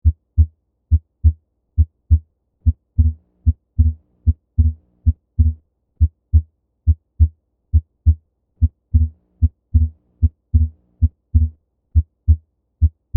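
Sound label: Pulmonary Split S2 Transient Supine Diaphragm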